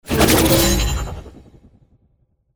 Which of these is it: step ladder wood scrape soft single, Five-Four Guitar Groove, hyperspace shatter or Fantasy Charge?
Fantasy Charge